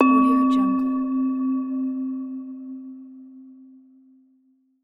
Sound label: secret found